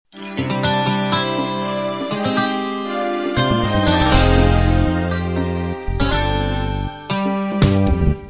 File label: uk rb intro